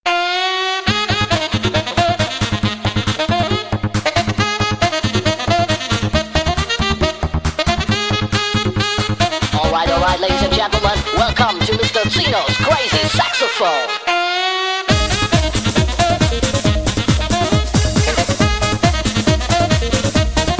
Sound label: crazy saxophone